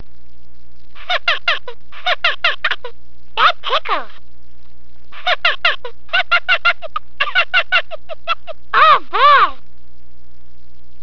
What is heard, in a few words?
haha